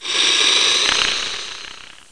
Hiss Click; Let's Discover Texas!

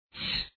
slide; puzzle safari

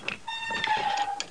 Open Canopy

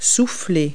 blow; Multi-Lingual Talking Picture Dictionary

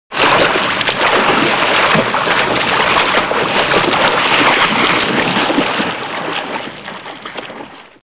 BLUB

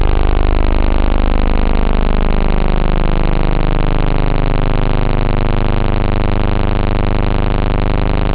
terror, synth bass

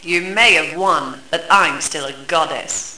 DEFEAT; Reversi • The Eclipse

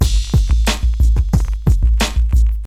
DM LOOP, HipHop, Drums; LiveAct Pool

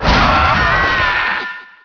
bug warriora; starship trooper; deaths; Nejlepší České Hry

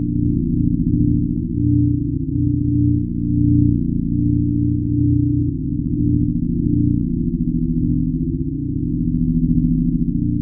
Large Hum

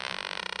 MIG31; Falcon 40

